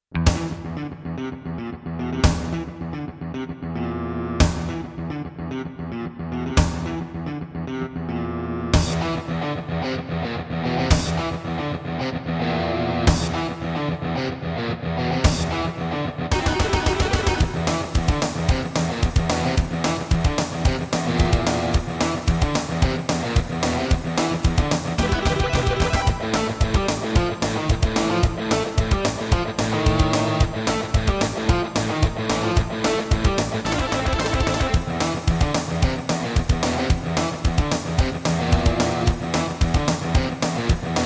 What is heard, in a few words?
Roland JV-1010; Doom - E1M1